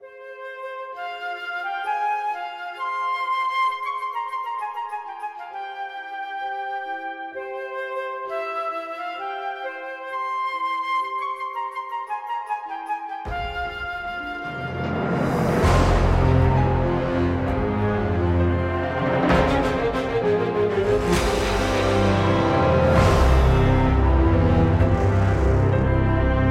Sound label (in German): Solo Floete